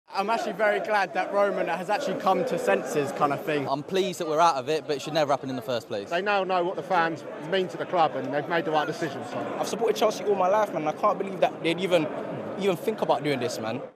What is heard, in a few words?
Chelsea fans outside Stamford Bridge